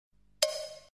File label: Unique Click Sms